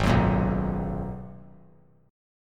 G sharp Minor 9th G sharp; G#m9 strummed; 8,13,13,9; JGuitar

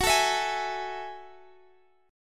F sharp Minor 9th F sharp; strummed; x,x,16,14,17,16

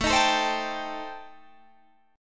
A Minor 7th Flat 5th A, Guitar, Standard; Am7b5; strummed; 17,18,19,17,20,17; down; capo=9

